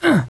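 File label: door lock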